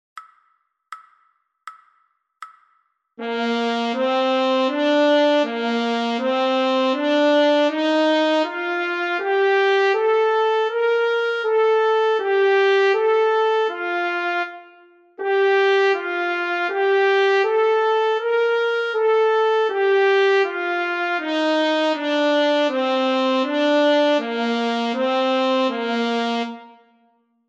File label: Scale Study trumpet